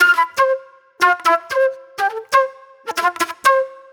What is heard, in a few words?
Jammy Flute; Sonic Foundry (Sony Creative Software); Manhattan Flutes; Super Brown